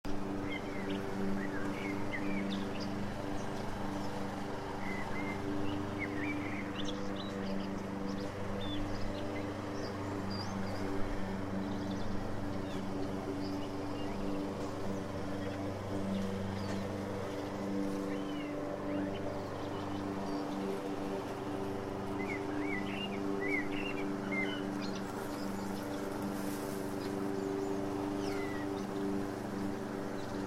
sunday afternoon birds, lovely birdsong but lawn mower